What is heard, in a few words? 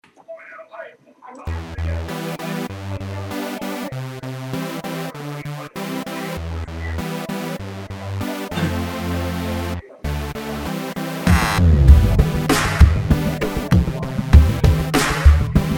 random techno